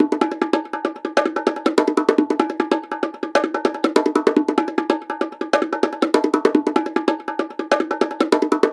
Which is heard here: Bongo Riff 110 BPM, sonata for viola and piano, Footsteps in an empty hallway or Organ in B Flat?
Bongo Riff 110 BPM